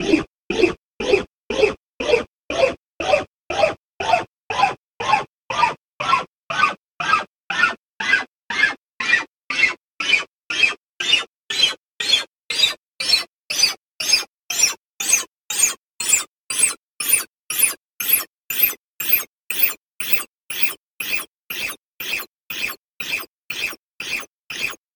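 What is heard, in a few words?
fret noise scale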